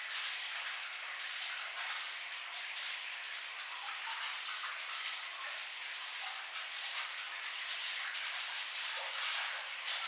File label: water running, undergrounds; soundscapes